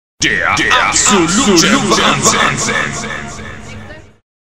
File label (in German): Kirmes Jingle; Kirmes Jingle 44 - Der Absolute Wahnsinn